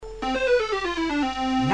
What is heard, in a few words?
Little Jingle That Plays When A Square Is Revealed On The TV Show; Catchphrase